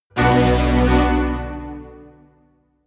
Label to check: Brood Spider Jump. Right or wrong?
Wrong